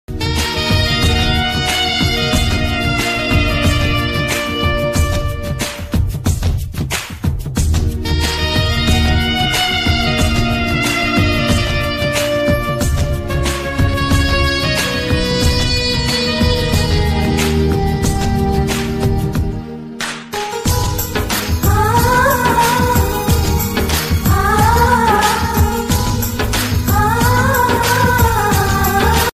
kannada ringtone; bhupathi film

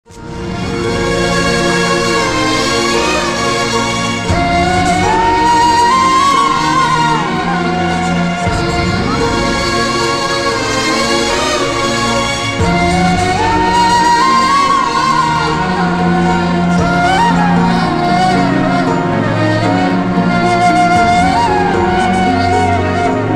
tamil movie bgm

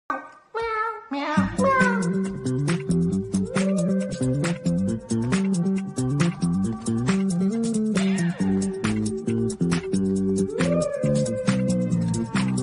bgm ringtone; love action drama; nivin pauly; nayanthar

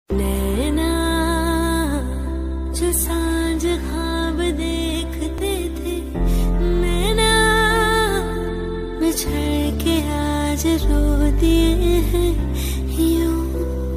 female version ringtone

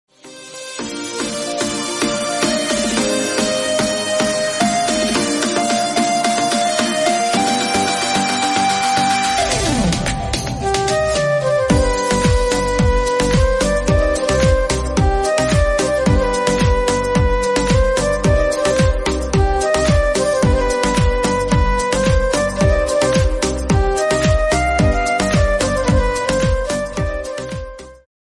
instrumental music ringtone

